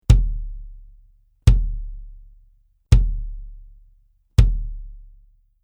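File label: BASS Clr Hard Plastic, bass drum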